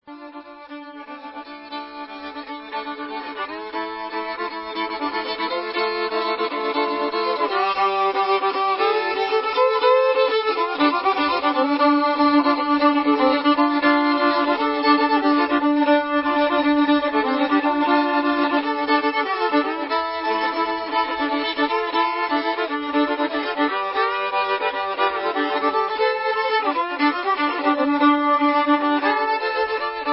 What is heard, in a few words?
blue river train d modal